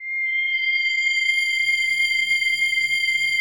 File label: digi pad, Pads